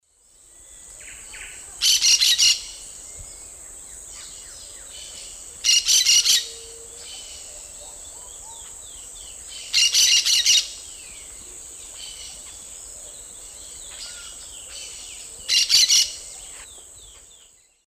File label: Brotogeris sanctithomae, calls; S. America; Eastern Lowlands